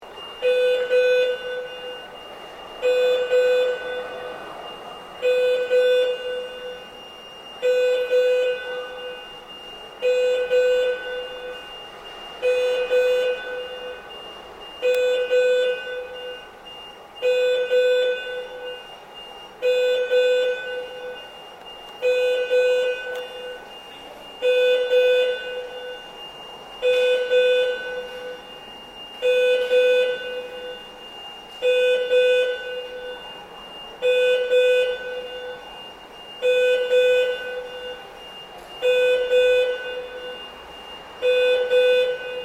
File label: Alarm Fire Builiding Warning System Loop